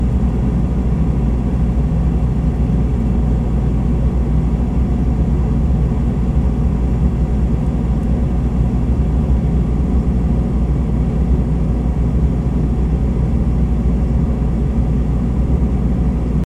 Freezer Drone Loop

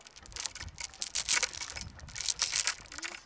Chain Rustling

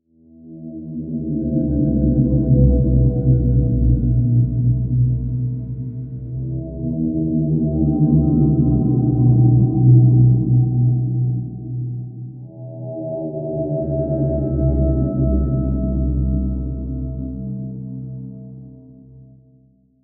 Dark Ambient Guitar Drone E C

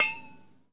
Ding

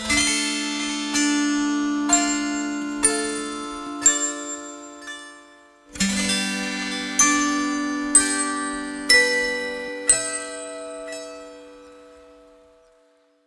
Fairy Intro

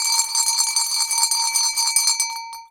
Nepal bell Swirl

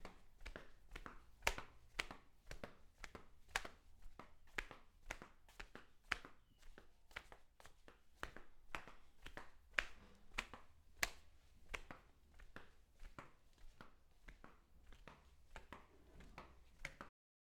Walking In Flipflops

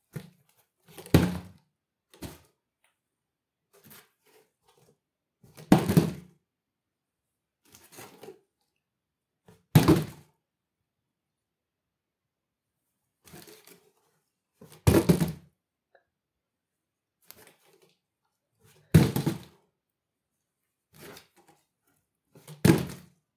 Cardboard Box Fall Impacts

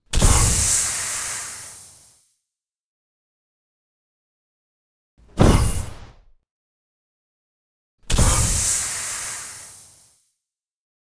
Smokebomb Sounds; Tmnt 2012 Inspired

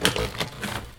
Robot Mech Movement

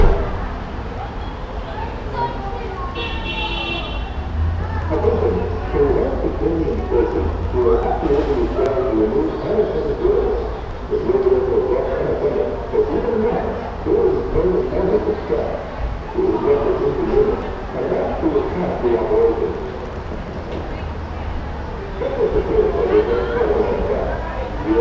Newark Airport Outside

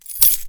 Keys

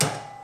Thud

